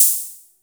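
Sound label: open hi-hat, drum machines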